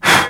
HUFF, Venu, Winds, Old World Instruments; E-MU Producer Series Vol. 7 – Old World Instruments

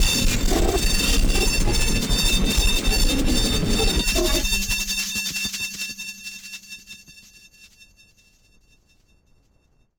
Battered Synth; malfunctioning synth samples

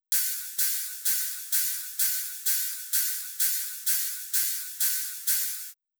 Cymbal, Drum Loop 128BPM; VEC5 Drumloops; VEC5 Multi Loops 128BPM; Loop Elements

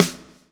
lash, backbeat

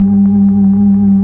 Optigan G#3; Optigan Keys

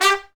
BRS TP, Roland, Stabs; Roland L-CDX-03

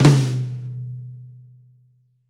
Pop Flam Tom, Roland BK-5

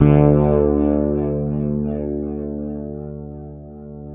FINGERED, Bass; Sampleheads; Will Lee Bass Library

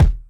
Drum Machines, Korg Triton, Under Hard Kit

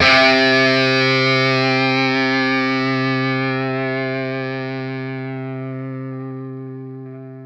SINGLE C3, Vintage Blues Guitar, ELEC GTR; USB Soundscan vol.22

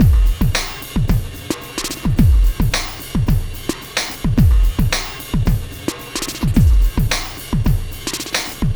Sky Lab Beat, Processed Drumkits

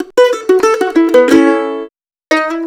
COUNTRY

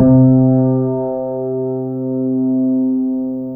Grand Hi A2, Fairlight CMI IIe, Pianos

